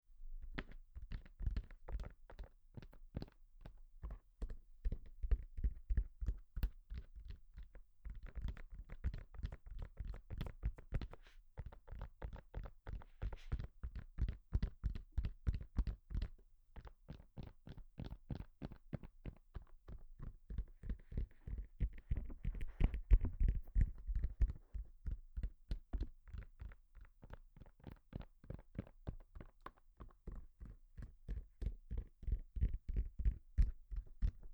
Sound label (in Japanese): タッピング, 音だけ 声なし